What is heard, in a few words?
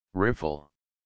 riffle